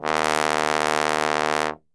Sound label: D#1 BASS TRB, Brass; Best Service; Brass Super Section